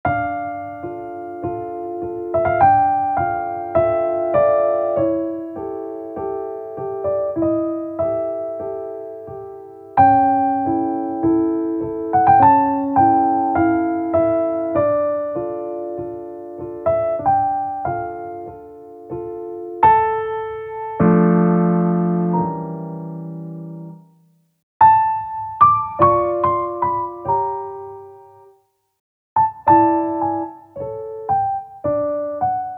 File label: Fantasia in D Minor, piano; K. 398; Mozart; 20 Piezas Simplificadas para Piano